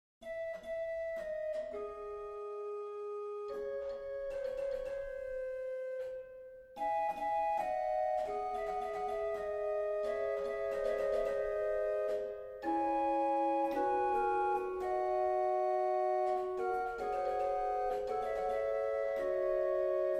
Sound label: Magnificat Flutes; Dandrieu; roquemaure